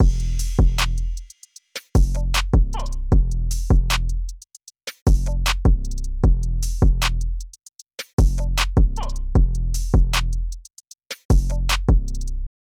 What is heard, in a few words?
Drums Aggressive Before